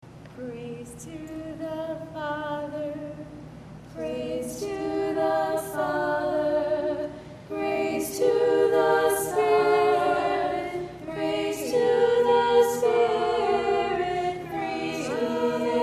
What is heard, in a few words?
round singing